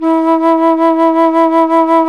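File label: Alto Flute vibrato; FLT; A.Flt vib 2; Roland L-CDX-03 Disk 1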